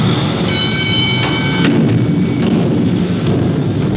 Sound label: cage